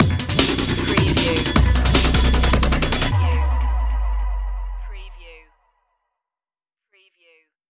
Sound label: urban assault stinger, Electronica Dark / Heavy